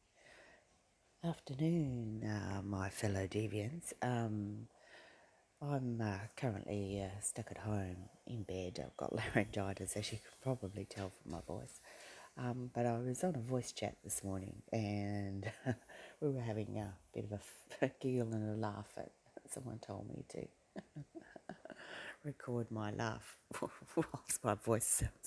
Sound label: Evil laugh